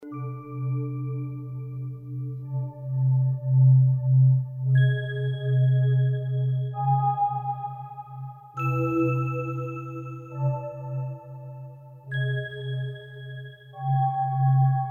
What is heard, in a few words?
Disturbing Chimes Looping